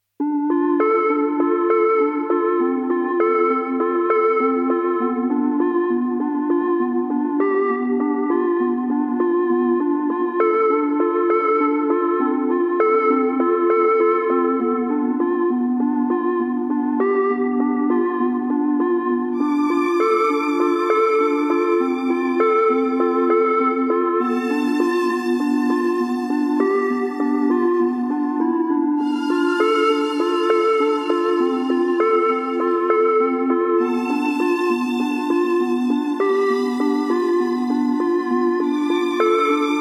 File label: synth